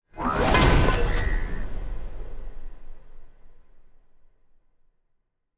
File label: ship construction ship move; Stellaris; shroud